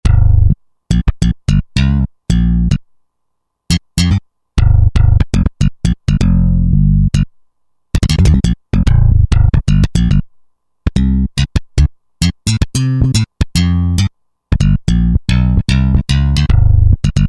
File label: Big Apple Bass, Roland JD-990; Factory Patches